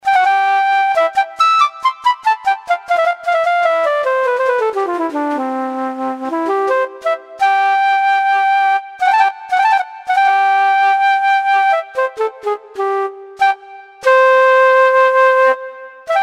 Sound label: flute; moss